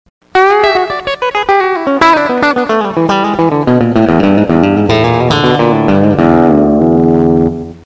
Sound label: drop dalmas, guitar